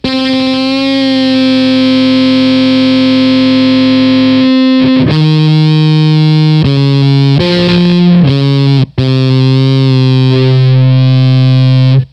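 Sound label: Fuzztest guitar preamp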